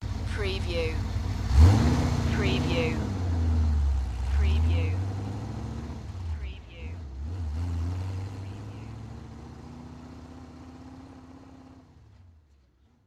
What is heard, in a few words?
Chevy V8 Hot Rod Drive Away